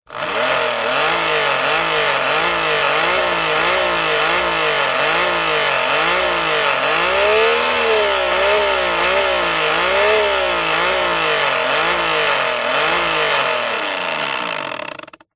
industrial pump electric handheld revs close to motor powerful